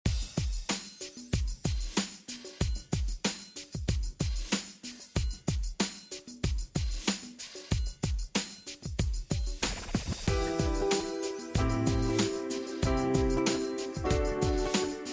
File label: music dance rnb